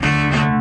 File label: orchestra string hit D; Orchestra String Hits; PADS and STRINGS